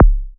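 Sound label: kick, ARP 2600 Drums; Polyend; Simulacrum Sounds; 1 Shots; Synths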